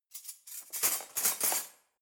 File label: cutlery knives drawer handle